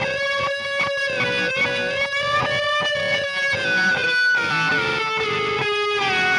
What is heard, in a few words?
Slide Wail, Guitars